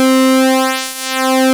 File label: PULSE MOD; E-MU Formula 4000 Series Vol. 3; Analog Odyssey